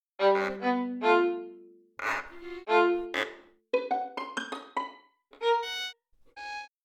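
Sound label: violin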